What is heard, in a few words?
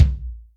2 Hed Med, Yamaha DTXpress Acoustic Kick